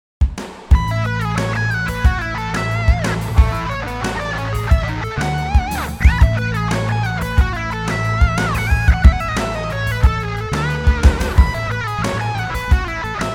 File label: midi drums